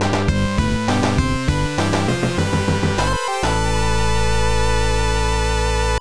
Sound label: act clear; Sonic 2 Wai Prototype